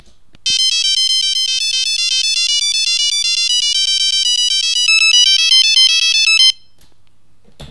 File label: phone baroque 16bps